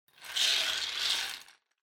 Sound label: Drawing Machine Garapon Spin